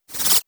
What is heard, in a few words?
Laser effector